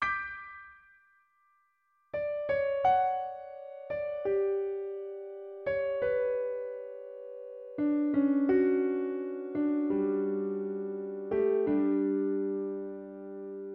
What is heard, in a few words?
piano; 2020 Cutlass Crew